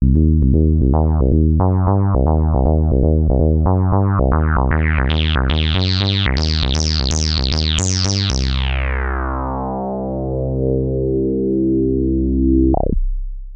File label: Acid SAW mono